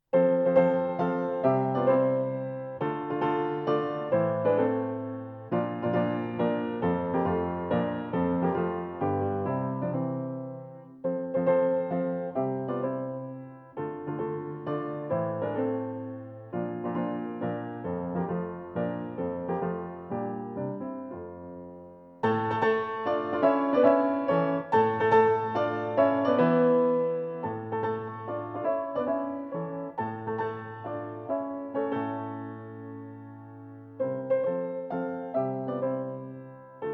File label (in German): F-Dur, Mazurka; Chopin; op 68